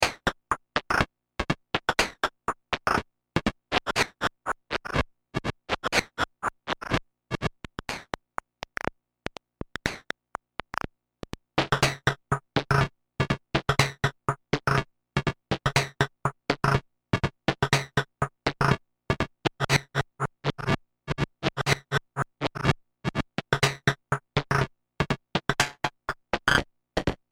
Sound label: Moog Mother 32 8 Bit Percussion